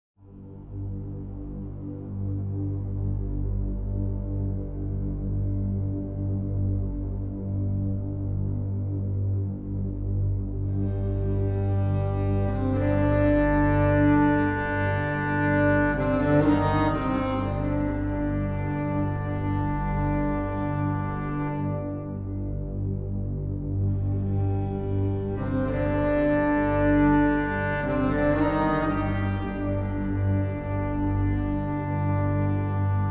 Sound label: Troll Forest Intro Music; bramble the mountain king; ps4 ps5 switch windows xbox one xbox series xs